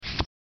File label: cards dealing